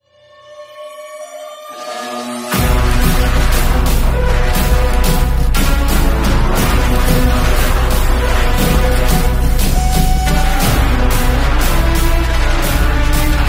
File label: ringtone; battlefield 2042